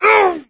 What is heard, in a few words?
hit flesh